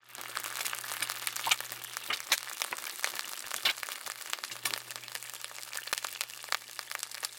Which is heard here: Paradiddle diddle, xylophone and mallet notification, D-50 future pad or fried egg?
fried egg